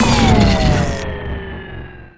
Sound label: holster, laser; weapons